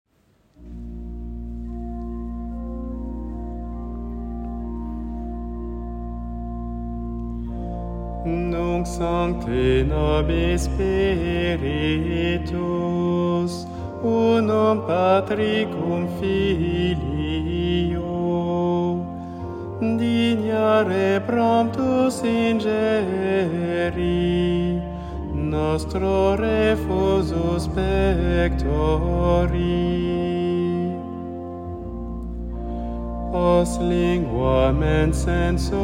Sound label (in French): tierce fetes impair ordinaire latin; heure mediane impaire ordinaire